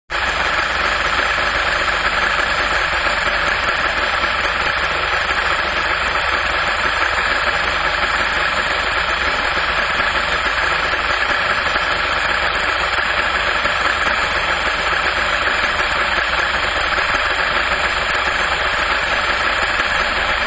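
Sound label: idling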